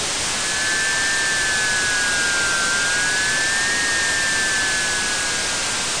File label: noise; Fairune Collection; PS4 Switch Windows